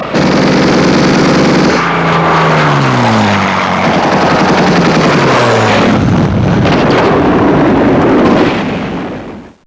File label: Ww2 Aircraft